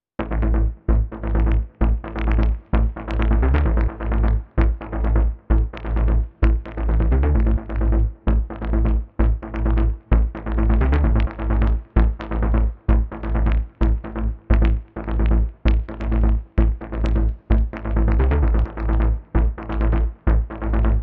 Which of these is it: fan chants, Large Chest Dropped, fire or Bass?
Bass